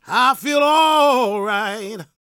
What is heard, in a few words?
gospel male, vocal; ILIO; Vocal Planet